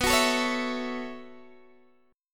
B 7th Suspended 2nd Suspended 4th down Guitar Open D, chord